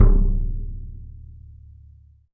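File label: RESONATING KICK; 3D Drums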